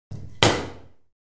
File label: Ping Pong Paddle Set